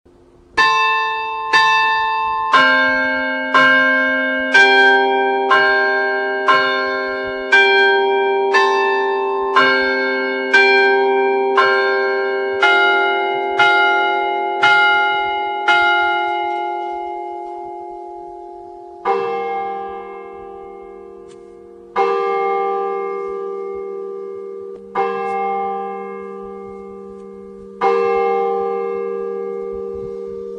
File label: long Chimes